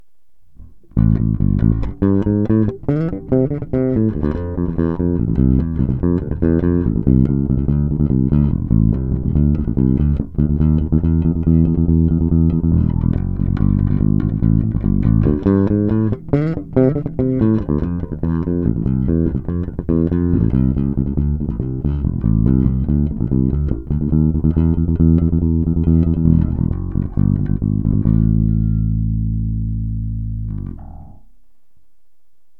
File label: Passive PU Middle EQ Flat